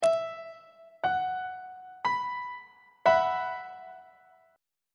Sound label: a double sharp suspended 4th 1st inversion on treble clef